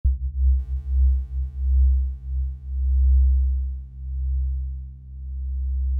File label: test buffer 256